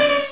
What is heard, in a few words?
laser